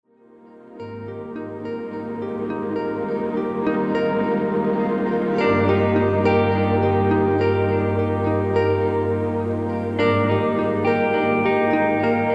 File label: Post-Rock